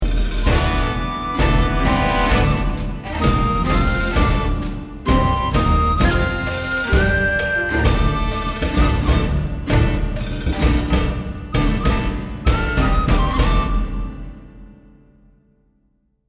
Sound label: start action